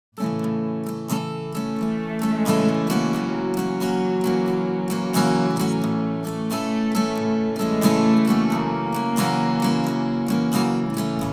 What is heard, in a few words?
Instrumental